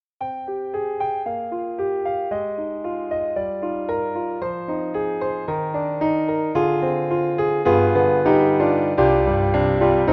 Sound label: Mini Grand Soft Pop Piano; AIR Music Technology